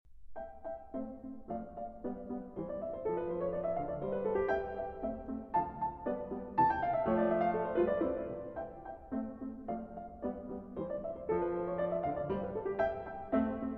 Piano Sonata No. 16 in C Major, K. 545 Sonata Facile III. Rondo - Allegretto; Mozart